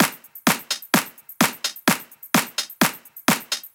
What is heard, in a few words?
Drumloops 128BPM